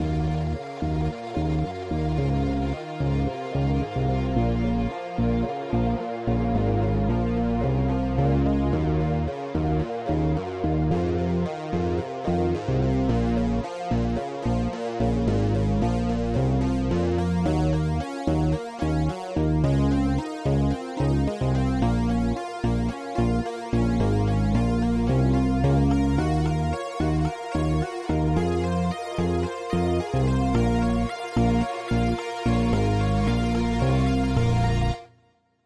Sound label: House Synth 110bpm